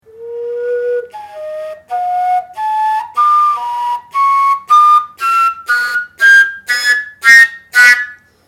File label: koncovka alternating voicing